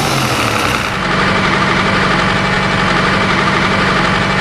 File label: throttle off, vehicles; ram